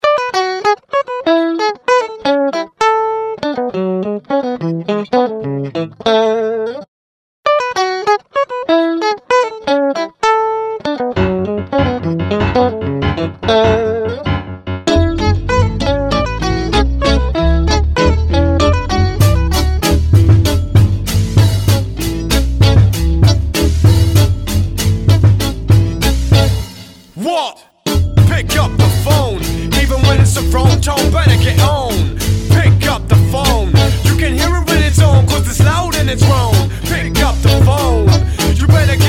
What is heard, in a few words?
Nokia Tone